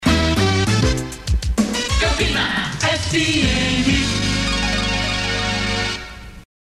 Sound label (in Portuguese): FM vinheta cantada; Campina FM Campina Grande PB